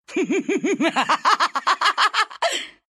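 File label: laugh, Russian; dota2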